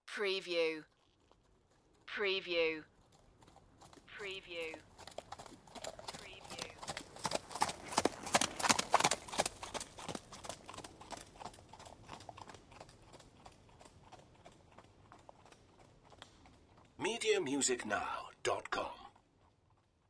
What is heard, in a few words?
Horse Gallop Pass By; Sound Effects Bible